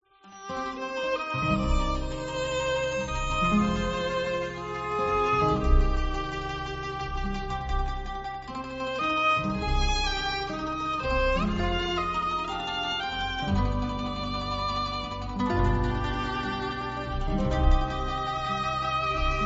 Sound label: italian mafia music